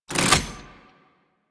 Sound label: Face Reveal Open; Warframe; Fortuna